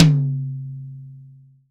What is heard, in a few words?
ROCK HI-TOM